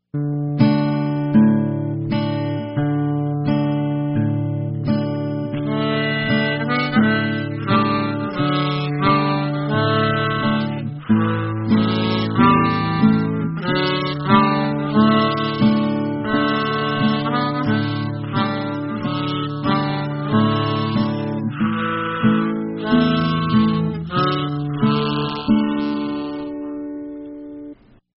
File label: guitar Pulmonica